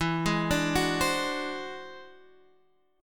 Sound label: E Augmented 9th E